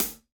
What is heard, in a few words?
smooth closed high hat sound a key, high-hat sample tuned to the A note; hi-hat